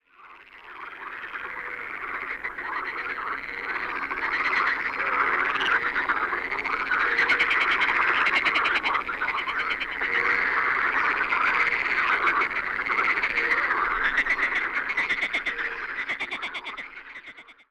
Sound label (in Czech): hlas zvuky zvirat